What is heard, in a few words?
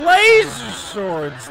lazer swords